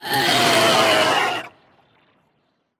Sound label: Mermaid Death VO; Legend of Keepers